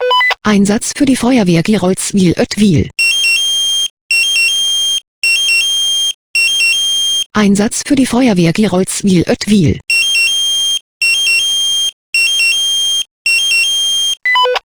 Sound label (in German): SMS Alarmierungstone; FW Geroldsw Oet